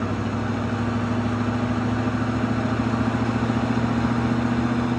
Walk

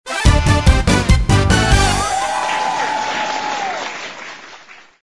Dice Final Result; Seer; GamigoNA

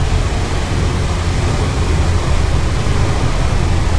Water Fall; Seer; GamigoNA

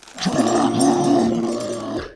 goblin mage idle

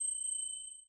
Letter Wing run; Seer; GamigoNA

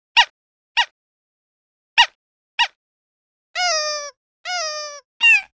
Deer Sled Action; Seer; GamigoNA

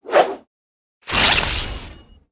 attack; TFocalor; Seer; GamigoNA

